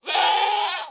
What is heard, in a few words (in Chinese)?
山羊尖叫声